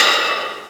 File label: volca beats crash; Korg Volca Beats